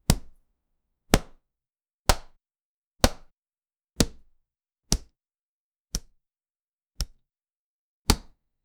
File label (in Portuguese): sons de socos com uma, boxing